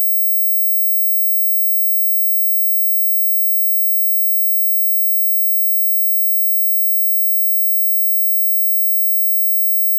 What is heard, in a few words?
mosquito ringtone